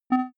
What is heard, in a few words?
click tonal distorted, signale; kommunikation